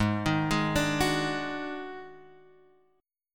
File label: Eb Minor Major 7th Double Flat 5th